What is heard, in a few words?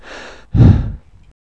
breath